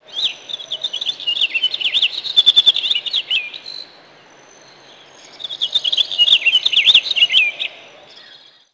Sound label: Nature Mountain Bluebird